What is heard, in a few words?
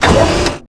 mech arm; Tony Hawk's Project 8; XBOX